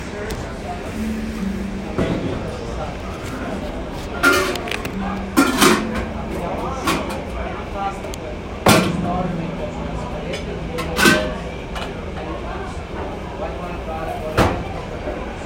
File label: Restaurant